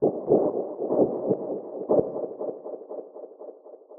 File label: Library Noise